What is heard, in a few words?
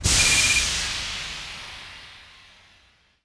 overheat, assault cannon; weapons